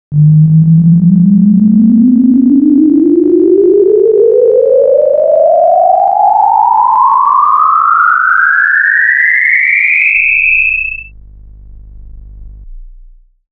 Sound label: ufo; wiesbaden